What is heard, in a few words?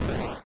boom